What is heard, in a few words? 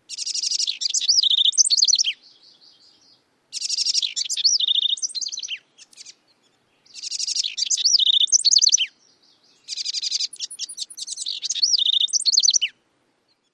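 song, house wren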